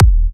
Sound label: Kick; WAProd Free Kick Samples 2018; WA Kick Pack 4